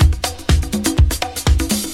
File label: sound splash